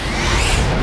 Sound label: skill shot charge; SkillSound